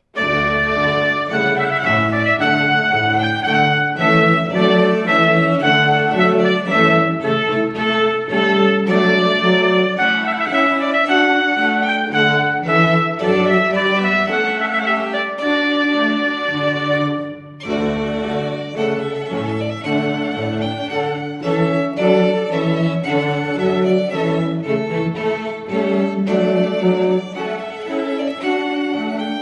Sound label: Trumpet Voluntary, string quartet